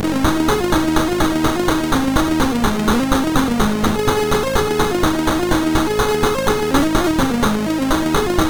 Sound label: sid MOS6581R4, GAMES; Aussie Games